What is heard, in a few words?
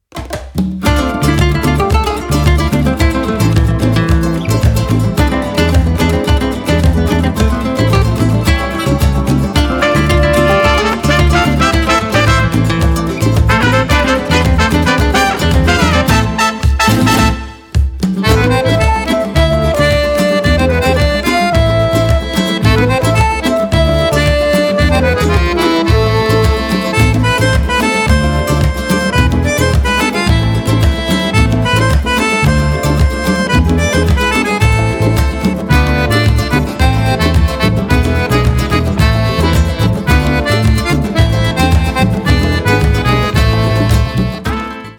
Rumba Flamme